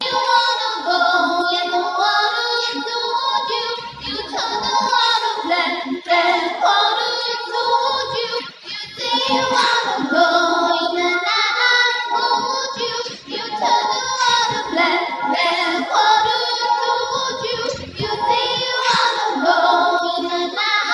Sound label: vocal